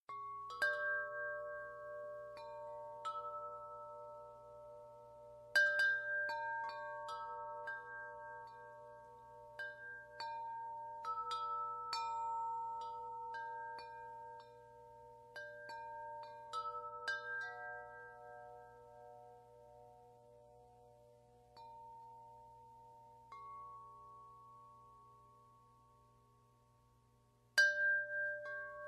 Relaxing wind chimes

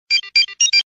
SMS tone phone ring; Kim Possible

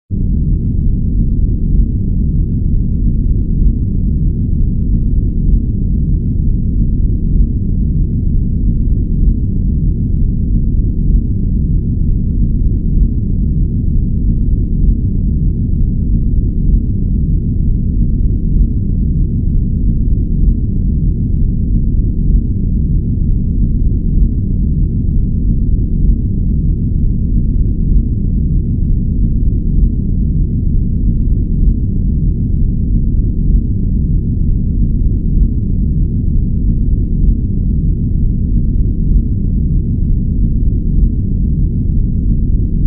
Warp Engine Background Noise; USS Enterprise D

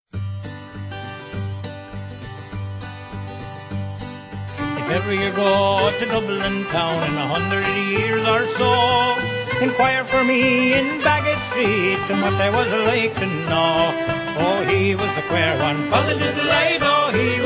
amazing perfect fun vocal sounds Irish accents

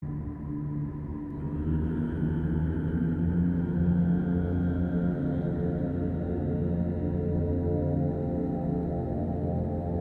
Music Ambience; Hellfire Peninsula; World of Warcraft

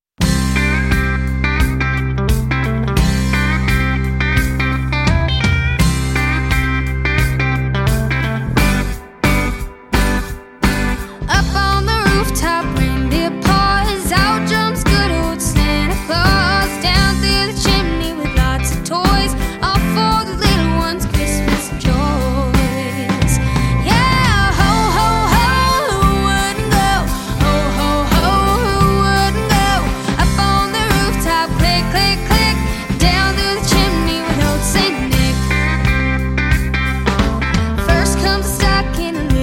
female country cover

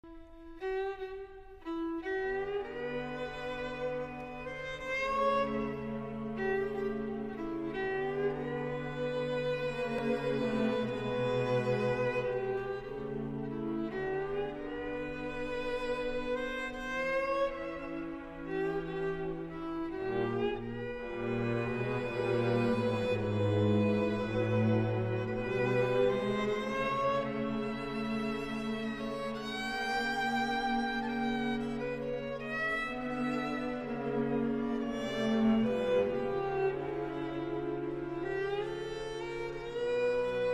Cotton Pickin Blues

